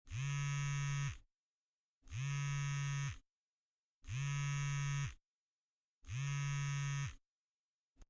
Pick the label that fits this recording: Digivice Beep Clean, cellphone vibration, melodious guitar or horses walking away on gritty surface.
cellphone vibration